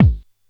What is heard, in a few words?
BD, DRUMS; Err Zee One; Polyend; Legowelt